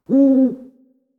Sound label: owl; soundscrate